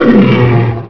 attack end; minotaur; quakemapping

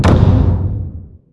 woodstp; necros; quakemapping; mod quoth

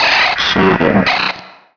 idle, enforcer; quakemapping

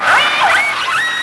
wsight; sentinel; quakemapping; mod xj18

